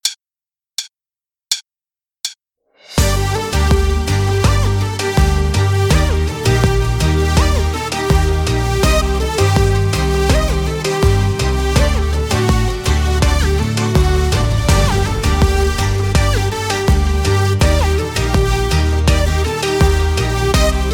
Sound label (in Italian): kizomba, base